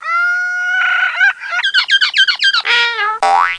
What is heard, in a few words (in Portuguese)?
Ovo; GEOGRAFIA